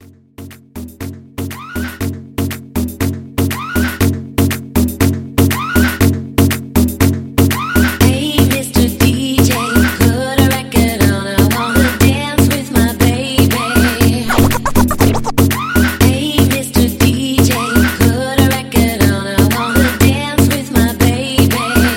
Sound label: Hype Mashup Riddim 120 CLEAN